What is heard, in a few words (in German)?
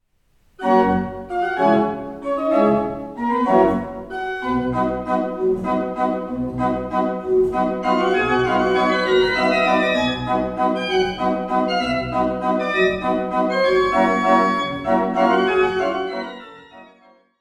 Fruehlingsstimmen Walzer